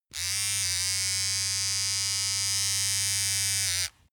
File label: razor small